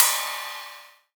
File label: Acoustic Drums - Ride; Antidote Advent